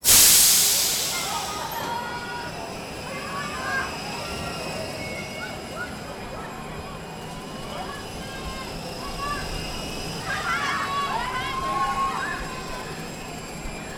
leisure fairground ride top spin; sound effects; rameses revenge; sound effects one

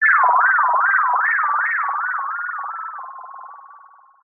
synth space weird synthy sci fi power down wobble; Jessey Drake

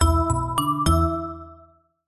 multimedia alert musical warm finish complete; zapsplat

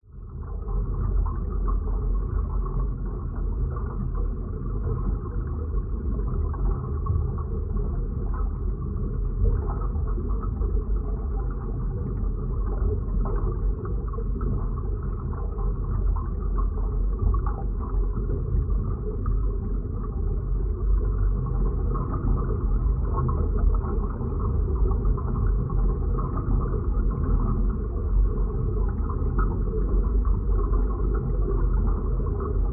ambience underwater iron sewer; lukas tvrdon

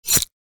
horror knife slice slash skin fleshy gore; zapsplat

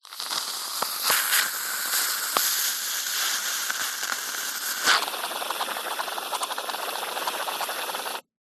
food cream squirty squirt short

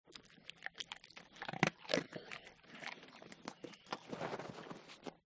DOG Stafford Eating; ANIMAL; smartsound

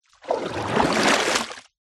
nature water deep movement surface

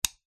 household switch plastic flick

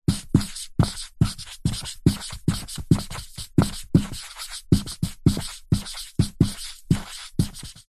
office whiteboard pen writing

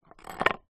broken piece concrete block movement drop; zapsplat

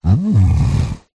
horror yeti monster growl grunt impressed

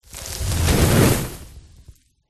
Designed Fire Short Swoosh burst sizzling; Gregor Quendel

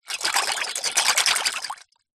food pasta dried in pan with water movements hand

